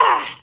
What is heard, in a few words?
oof; speech